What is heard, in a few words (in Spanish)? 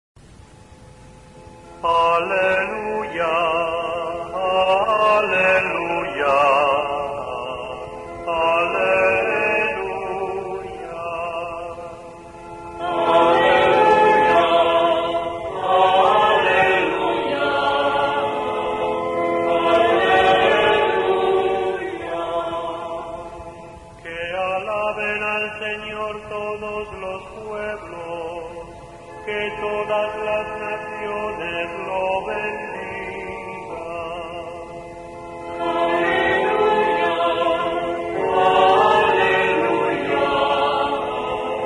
Aleluia